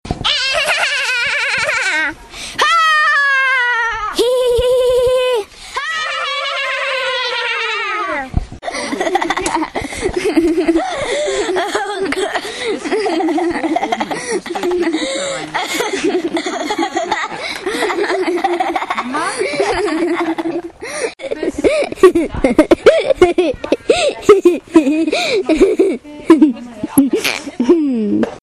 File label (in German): alle lachen; harlaching